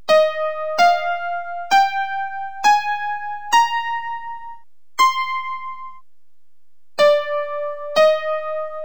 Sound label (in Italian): SCALA IN RE